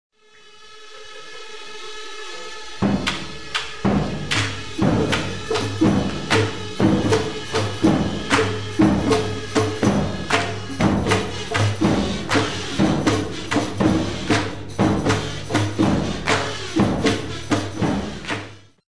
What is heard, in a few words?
kazoo